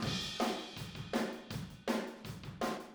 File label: Room ST, Drums, 162 BPM; Drums from the Big Room The Mixes; Take Your Toms Off; Chorus 06